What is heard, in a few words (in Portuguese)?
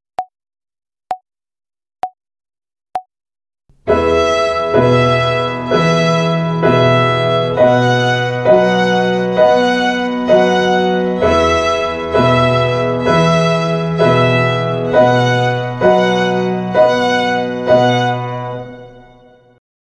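Ginástica para o dedo 1 - Corda Mi - Quaternária, Violino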